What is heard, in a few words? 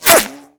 bullet teddybear; weapons; Modern Warfare